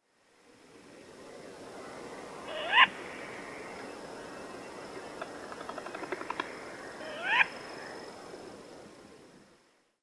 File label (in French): hibou des marais asio flammeus